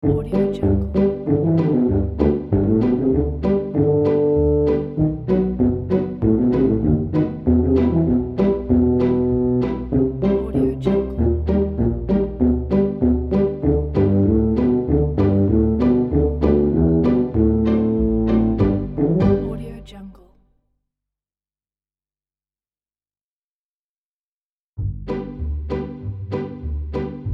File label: stars and stripes tuba logo